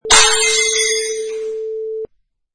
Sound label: Plate Breaks